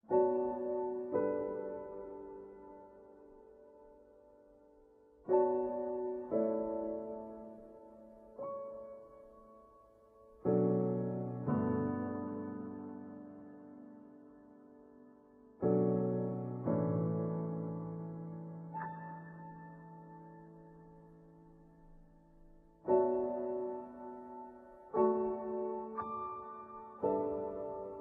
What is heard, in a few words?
Sad Scene; menherafflesia flowering abyss; android